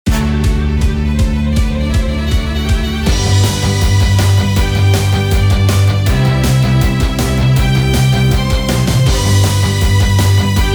Victory Song; Ikusa Megami Zero

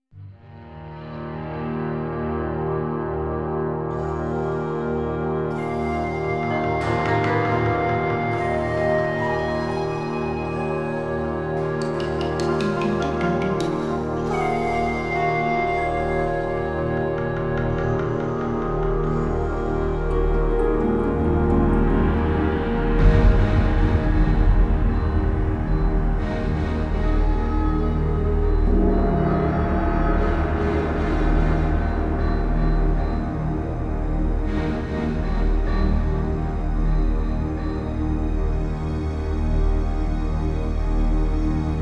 Background Music; Platoon

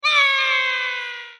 Falling; Yet Another Pixel Dungeon; Android

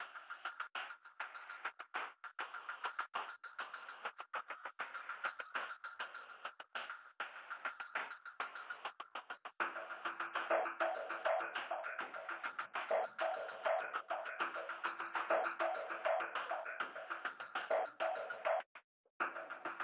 Mix Stem Layer; quantum recharged gamerip 2023

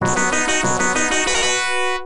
Unused Jingle; Argus; arcade

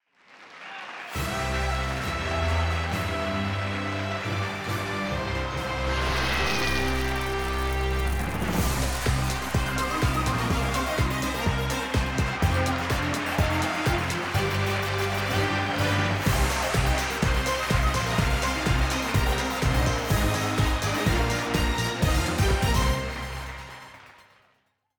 Intro Fanfare; BTV

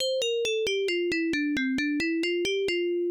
Unused Jingle; Garfield Caught in the Act; Genesis

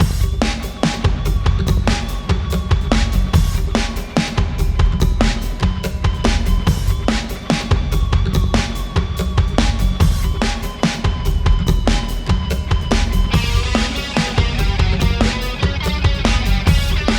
music dragon theme

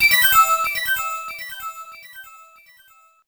Insert Coin

